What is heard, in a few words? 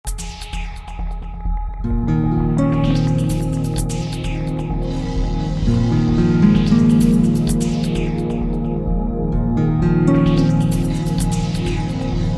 Scary